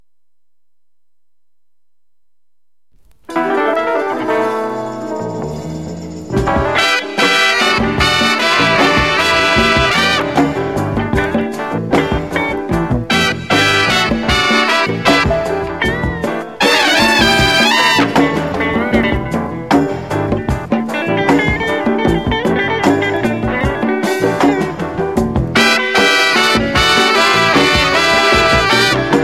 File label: funk